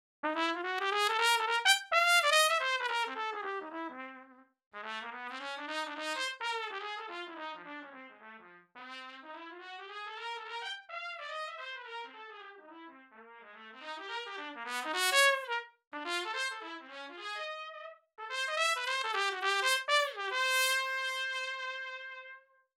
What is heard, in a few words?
Flying Trumpet